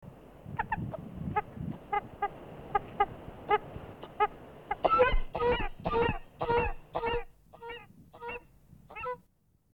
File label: tadorna cana, birds calls; anatidae